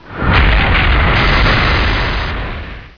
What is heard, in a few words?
napalm